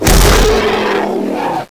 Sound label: warrior hiss